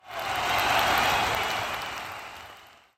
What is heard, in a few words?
win; nerf-ultimate-party